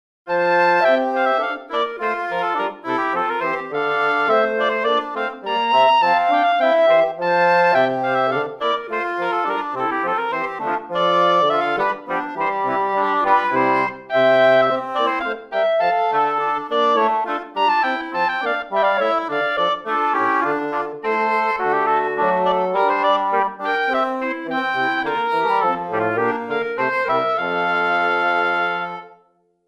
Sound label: Hornpipe